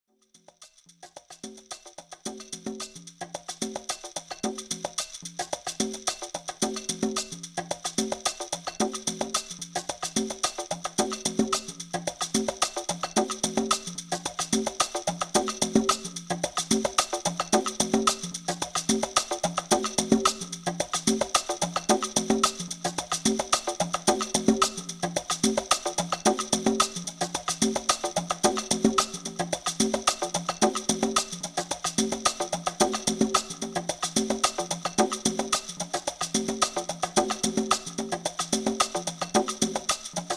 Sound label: Bongo Bongo